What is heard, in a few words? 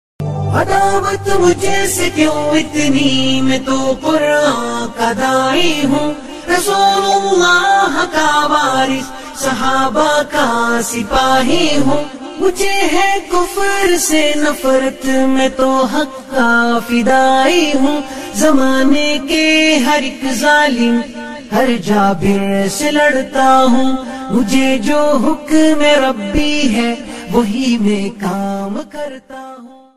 New Islamic Ringtone